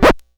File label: Needle Drag, Vinyl Record Samples; Needle Noises